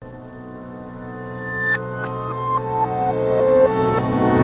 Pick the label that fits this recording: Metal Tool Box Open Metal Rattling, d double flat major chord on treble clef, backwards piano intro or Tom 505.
backwards piano intro